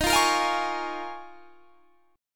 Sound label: Eb 7th Suspended 2nd Suspended 4th Eb; strummed; 23,20,20,22,21,21